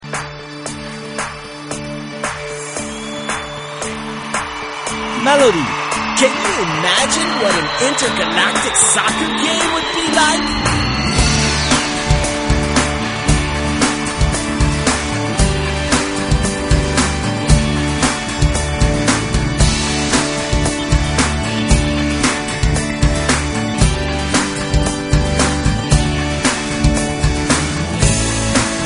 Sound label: melody